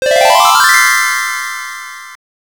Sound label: Coin; The Fairyland Story x68000